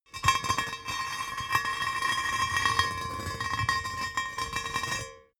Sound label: metal heavy hollow rolling concrete